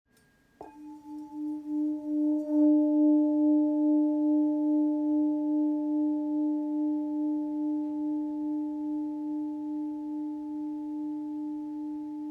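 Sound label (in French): Bol real FM re, bol chantant; Capricorne 745g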